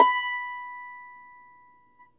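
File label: banjo B3; Sonic Reality; American Heartland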